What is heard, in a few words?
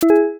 chime; stream brb